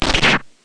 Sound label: powerful energy gun; gonner linux macos ps4 switch windows xbox one gamerip 2016